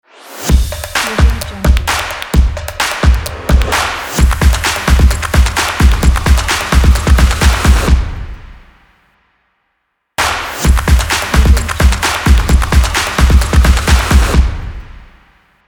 stomps logo

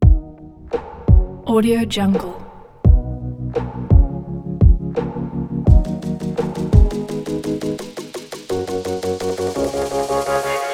pop product promo

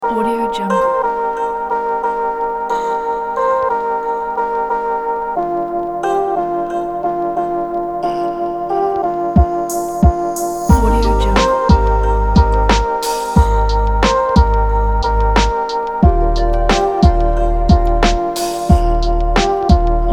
warm beauty chill

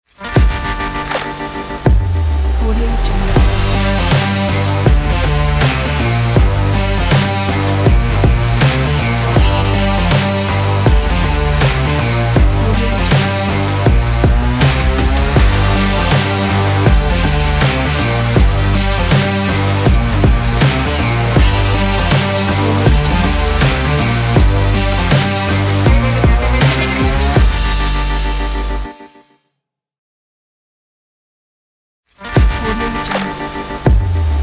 powerful rock stomp logo

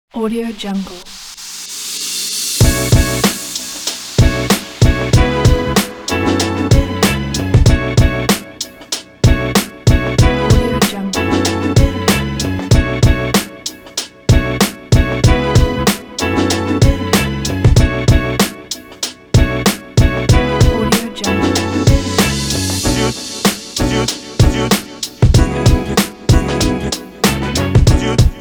hip hop street beat

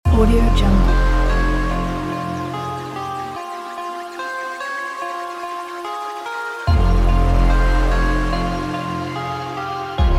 fight sport trap music

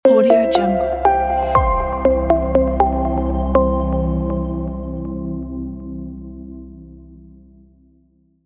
modern logo intro; sawtooz

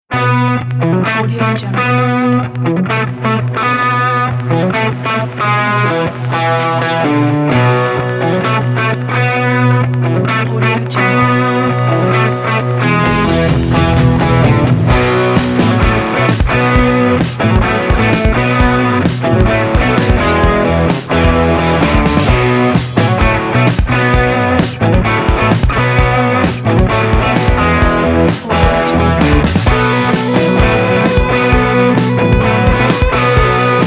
alternative rock intro

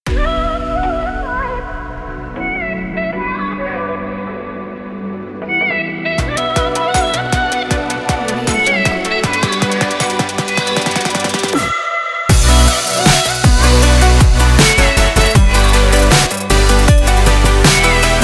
future is bass

